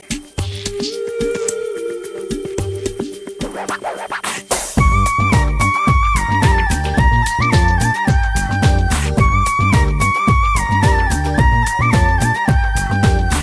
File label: Cool Flute, Kannada